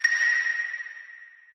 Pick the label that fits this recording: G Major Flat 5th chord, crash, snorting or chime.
chime